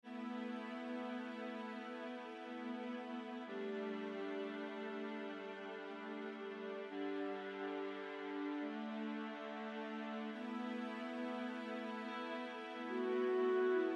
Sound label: Emotional Bittersweet